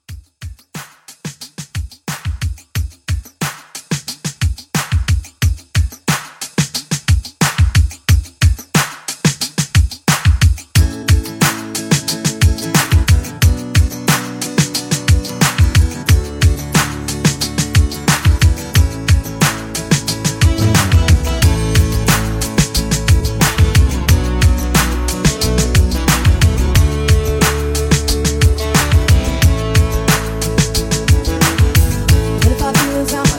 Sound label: 90s Rock Re Drum